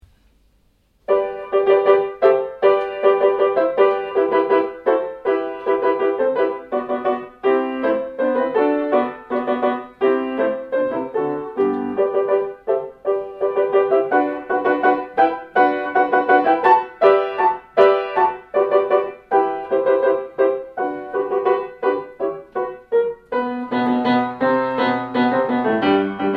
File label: joyous march